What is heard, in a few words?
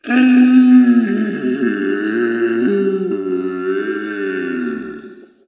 Monster Dying